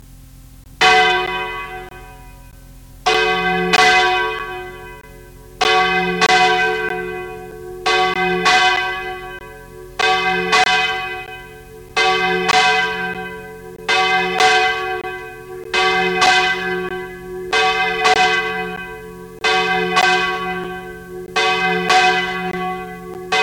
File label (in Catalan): campana